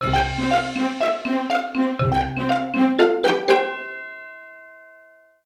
Jingle Win; beware planet earth gamerip

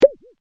popup Open; Game Dev Tycoon

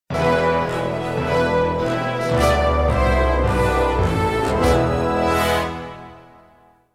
Fanfare; Godzilla Save the Earth

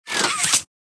hand retract; Extendo Hands

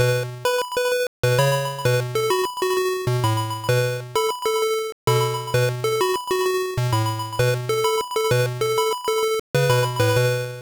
Taiko Performance Song

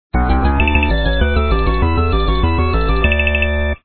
Level Start; SpongeBob SquarePants Sea Monster Smoosh

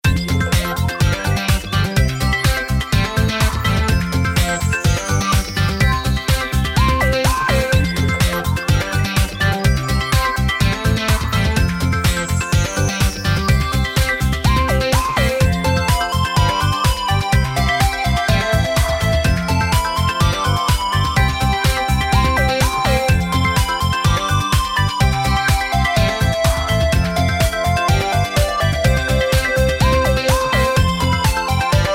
Level Music; Family Restaurant; windows

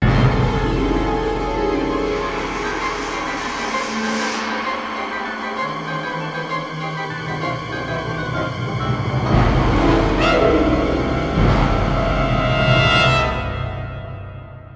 Spooky Forest Mice; Shrek 2; GC PS2 Windows Xbox

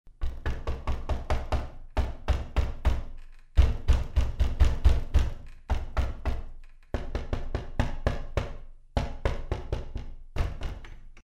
Paddock bang door; the 39 steps

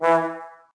E Trombone

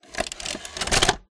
TV Sound vhs tape insert

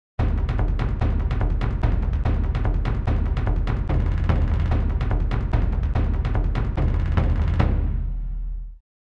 Drumroll; Mortal Kombat 4; n64; Fatality Drumroll

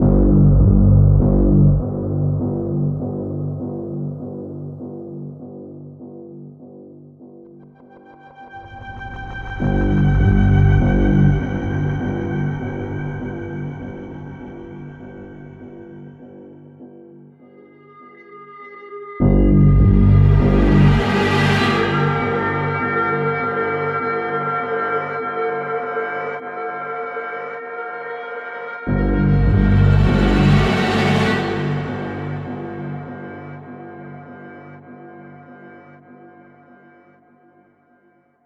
Suspense Oneshot

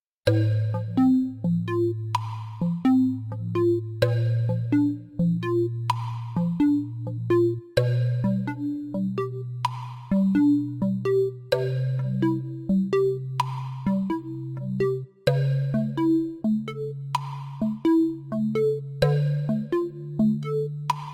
In Game Music (90 Second Countdown); Kahoot

